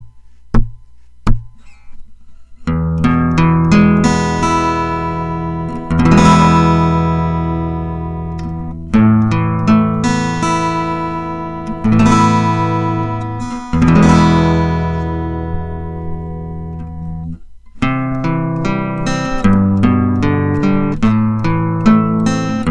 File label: piezo, acpickup; ピエゾ